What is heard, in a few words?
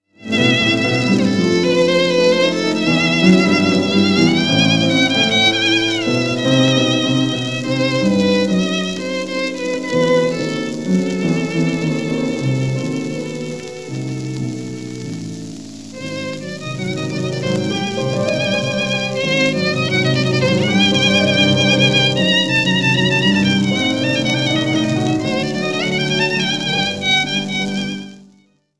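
Hebrew melody, versions for violin and piano; Gerald Moore; op 33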